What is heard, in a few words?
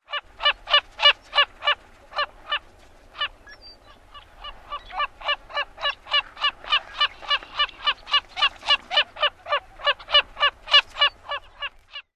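Wilson's phalarope alarm calls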